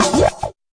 fx drum

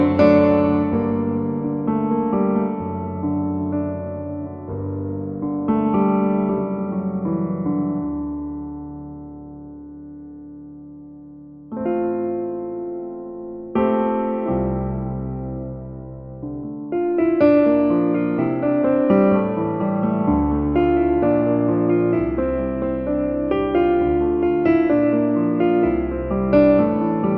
popish piano progressions; KEYS ORGAN PIANO

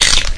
nasty stormfront crackle, Electro FX

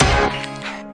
stab; Punches Hits Discoblasts

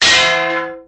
vintage cartoon clang; Cartoon Sound Effects Kit

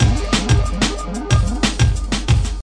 184 straight atari

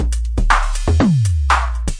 120 bright cymbals break, drum loops and breaks

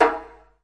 djembe big hit; ETHNIC and WORLD PERCUSSION

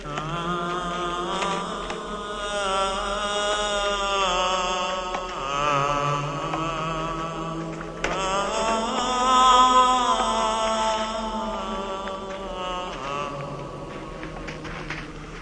moaning singing, MALE SINGING

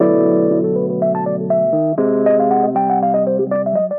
120 soul jazz rhodes walkdown; KEYS ORGAN PIANO LOOPS

